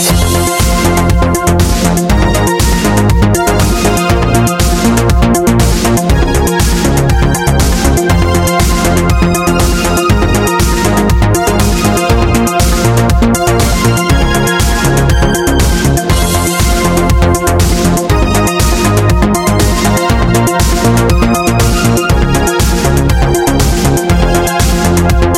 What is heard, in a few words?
disco ringtone